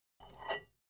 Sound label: Free Weights Exerci